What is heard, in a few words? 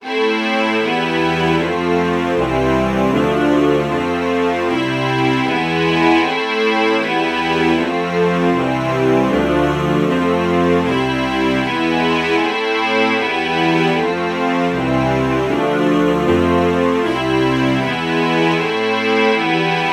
violin alto saxophone true chord morph